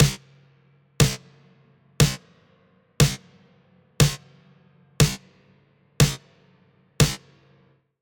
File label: Snare, Gated Resonator, feedback echo; Newfangled Audio